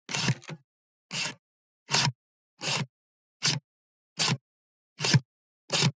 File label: Levers Sliders; soundbits